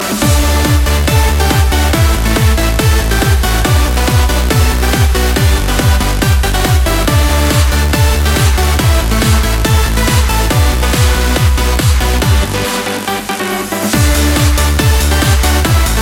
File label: Trance Tune